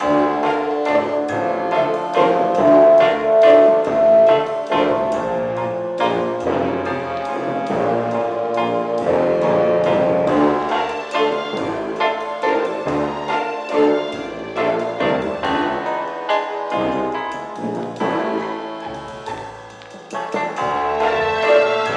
gramaphone CreepyForest vinyl; Don't Starve Giant Edition; PS Vita

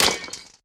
Break Spear

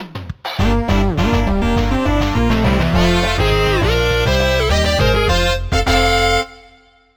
Outro; Fuel-Injected Forest Outro; Cars Supercharged; Leapster

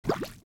Monsters bubbles; The Binding of Isaac; SFX Monsters boss2

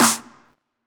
DRUM

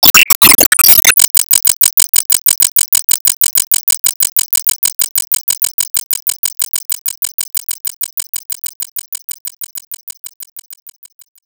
Circle Laser Loop

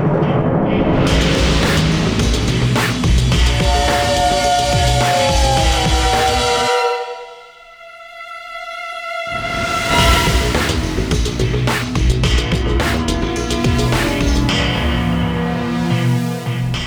Fight loop; Ben 10 Protector of Earth; Wii

